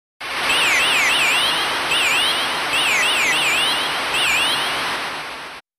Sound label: Cheering